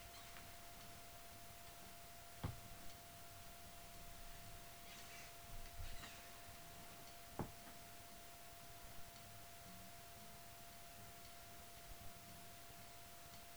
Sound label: vocals background